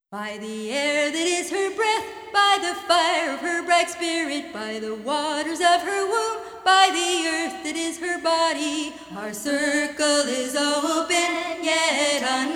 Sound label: Closing Song